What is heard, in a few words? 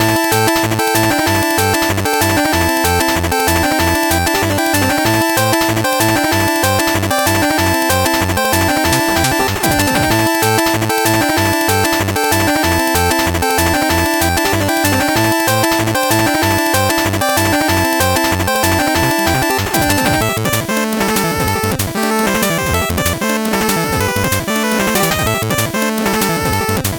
8bit bgm normal